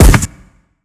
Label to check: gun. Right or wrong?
Right